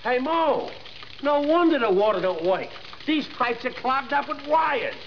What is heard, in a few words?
pipe wire